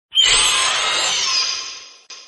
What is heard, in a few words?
Phoenix Bird Roar Sound